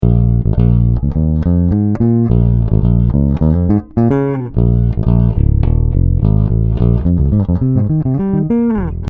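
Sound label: neck series passive; GL tribute5 L 2500 ct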